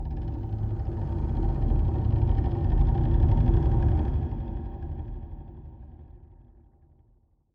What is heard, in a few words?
Music Stinger; HDF Ch2